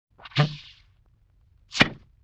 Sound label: Sloop Poink, Vintage Cartoon Comedy Vocals